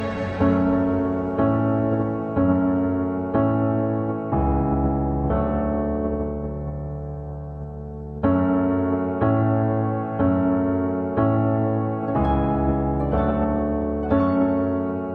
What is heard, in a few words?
Instrumental